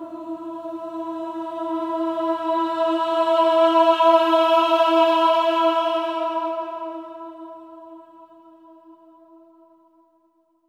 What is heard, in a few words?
AH E4, swell ahs, Symphony of Voices; Spectrasonics